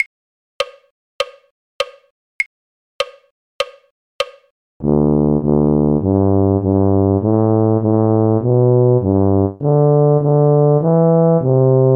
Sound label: tuba; carols